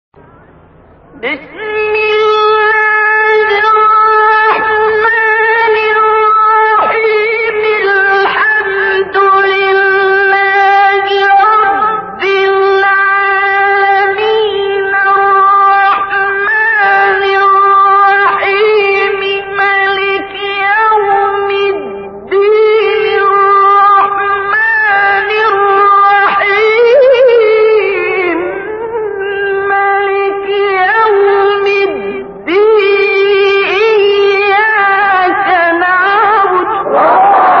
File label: quran